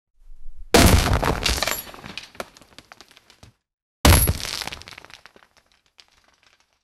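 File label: sound effect of human body, destruction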